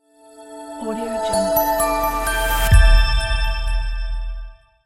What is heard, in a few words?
space logo reveal